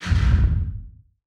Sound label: distance space amoeba fire, weapons; Stellaris